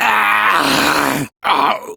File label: Death, Barks; Kuva Lich; Persona D